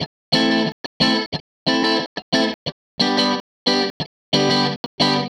Strat Guitar Loop

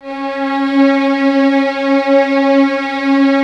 C#4, String Ensembles Violins; Miroslav Vitous; 11 Violins

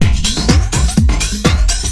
techno flange, Deep House 125bpm

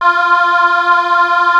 C 605 CHOR, Yamaha C605; TECHNO-BWARE

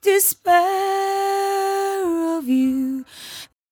57bpm Am, Velvet Vocal; Zero-G

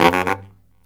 Killer Brass Riffs; USB Soundscan vol.29 - Killer Brass Riffs; AKAI; BARYT

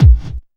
hardcore 1, bassdrum; Ueberschall; Techno Trance Essentials; DRUMS

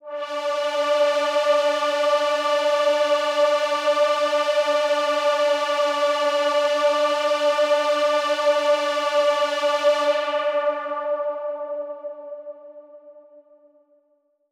Big Pad D3; KG MegaSaur

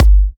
G, Kicks; markus hakala

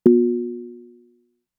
Percs, Elektron MachineDrum SPS1 MKII

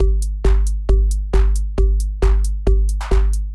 Mixed Beat; Sonic Foundry (Sony Creative Software); Afterhours EDM; Session 10 - Come In Hard

